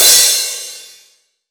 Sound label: crash C key, Royality free crash cymbal sound clip tuned to the C note. Loudest frequency